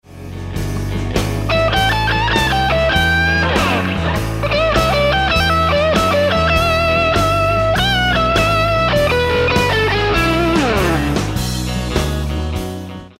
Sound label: Lick, guitar